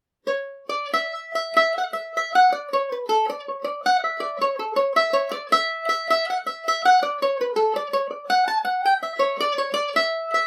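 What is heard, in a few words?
D major, irish mandolin; The Priest And His Boots; G&O 34 mandolin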